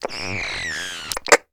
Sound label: Walkie Talkie Interference; Communications; 1001 Sound Effects